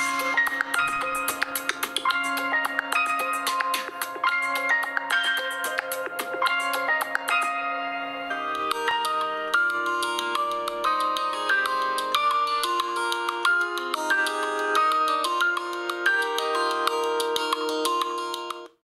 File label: Asus ringtone